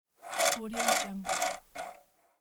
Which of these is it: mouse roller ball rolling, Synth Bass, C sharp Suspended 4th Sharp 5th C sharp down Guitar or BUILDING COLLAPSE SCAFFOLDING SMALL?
mouse roller ball rolling